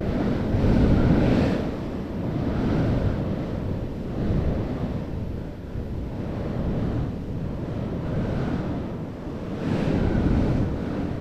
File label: wind